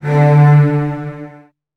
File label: D2, Virtuoso Strings, 16TH; ILIO; Kirk Hunter; CL 16TH